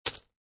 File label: Poker Chips Dropping On Poker Chips; Poker, gambling, chip; clicking